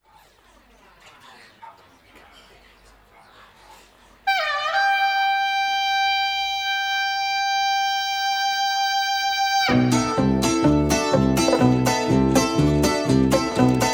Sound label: Wodka Beat